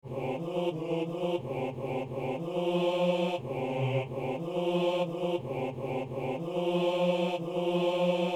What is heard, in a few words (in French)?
psaume, basses; Psaume 116 (117)